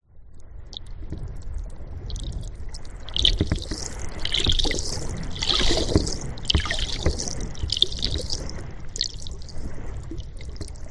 nature water waves small lap to shore from passing boat underwater recording; hydrophone; zapsplat